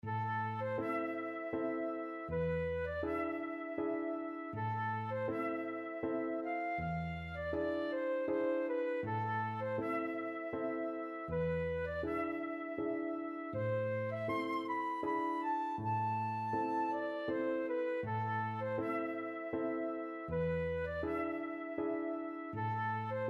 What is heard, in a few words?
flute